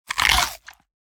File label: bite; battle